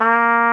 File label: warm trumpet A#2; InVision Interactive; Lightware VOL-1; Instruments & Percussions